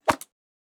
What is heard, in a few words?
arrow swoosh